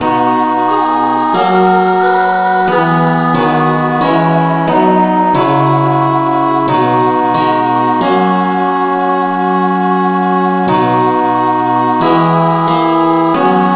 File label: revnovocal key b; Gwalchmai; Glory be to God on high